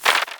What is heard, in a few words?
Page Turn